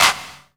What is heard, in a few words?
Claps; Vengeance Samples